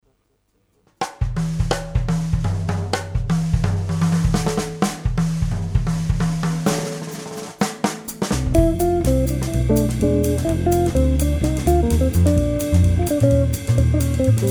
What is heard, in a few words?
Trio fast jazz